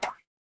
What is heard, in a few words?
cartoon bonk